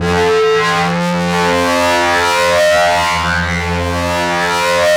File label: FLYER F1 R, Yamaha VP-1; Music Store; 90's Sample CDs